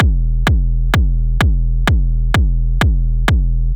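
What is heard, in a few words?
Drum Loop 128BPM bass drum F; VEC5; Drumloops; Multi Loops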